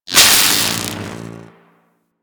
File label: Electricity Hit Effect; Warframe